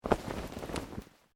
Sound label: Clothing Shirt Cotton Move